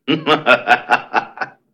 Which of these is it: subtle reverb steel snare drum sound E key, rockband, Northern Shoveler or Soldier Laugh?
Soldier Laugh